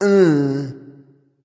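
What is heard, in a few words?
non-verbal tongue; smite; voicepack; houyi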